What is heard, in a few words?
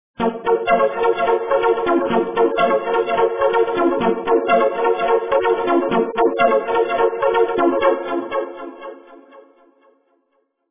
Electro; NIU N109 Niutek